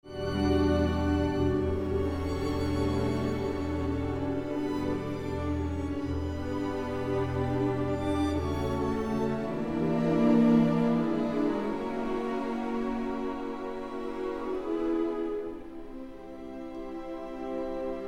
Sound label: PAD